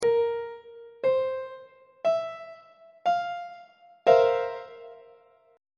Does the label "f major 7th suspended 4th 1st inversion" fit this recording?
Yes